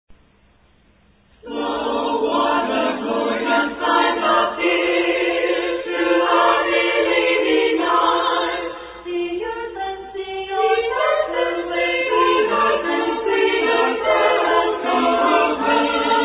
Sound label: a capella hymn, what a glorious